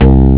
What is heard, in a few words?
bass guit; jazz jackrabbit 1; M8